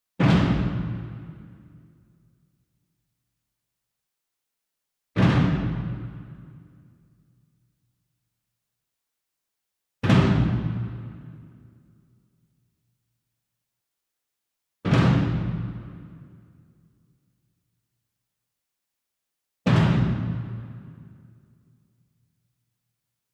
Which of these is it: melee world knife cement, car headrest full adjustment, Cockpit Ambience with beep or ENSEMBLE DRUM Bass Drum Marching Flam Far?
ENSEMBLE DRUM Bass Drum Marching Flam Far